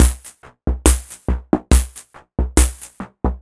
perc 140 steady, Percussive Loops 140 bpm; steadyeddie

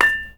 KONGWONGLEK A6; Spectrasonics; Heart of Asia

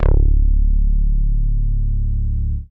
CONCRETE BASS; Masterbits; Dance Trax

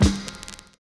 SLOWPITCH

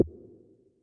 snap, Yamaha Tenori-On Snapkit

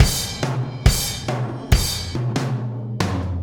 Landing Party Beat, Processed Drumkits

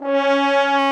BRS 4 HORNS; Roland L-CDX-03 Disk 2; F.Horns FX+

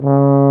BRS F TUBA, LOW BRASS; Roland - LA Composer vol. 1

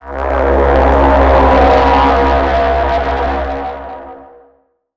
Texture 3 - C; 99Sounds; Monosounds; Music Loops; Instrument Oneshots

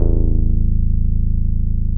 A1, Bass; E-MU Producer Series Vol. 6 – Analogia Project (CD 1); Boom da Bass 3

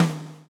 swing

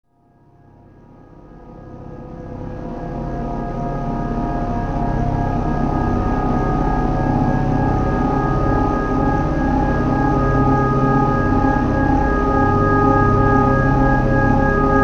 Deep Space Bass, Tones; Bizarre Planet

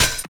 combi drums

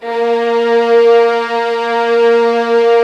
A#3, soft violins; Q Up Arts; Denny Jaeger Master Violin Library

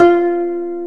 koto F2; AKAI S-Series CD-ROM Sound Library VOL-7

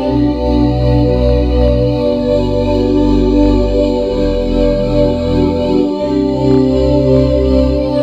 pad new age; Pads; Sonic Foundry (Sony Creative Software); Ugly Remnants VOL-2

